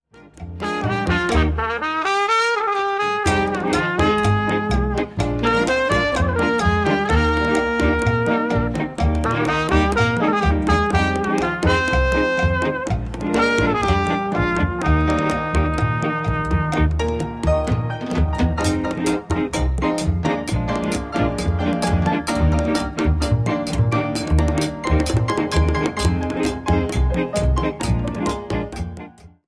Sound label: Cha-Cha